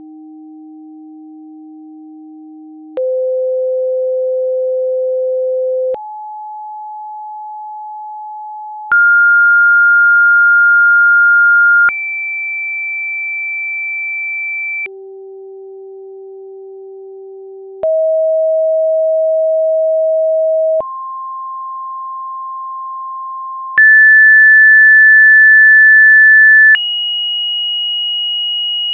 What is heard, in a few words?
tone